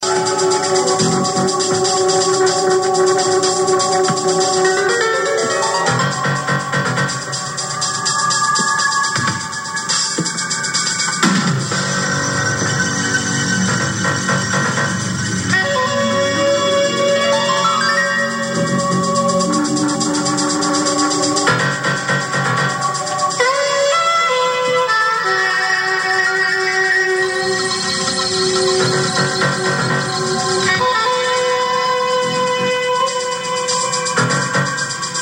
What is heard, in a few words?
suspense theme